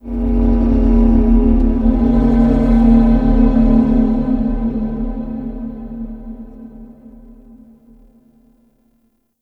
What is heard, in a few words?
Glass Texture Large, Ambience; Warframe; Cephalon Weave